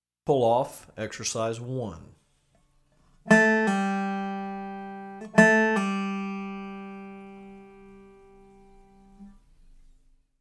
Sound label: Pull Off Exercise